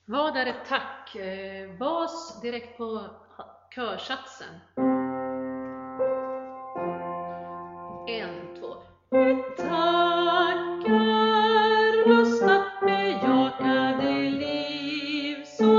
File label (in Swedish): Vad ar ett tack bas